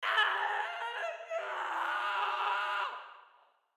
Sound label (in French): Cri homme; Ville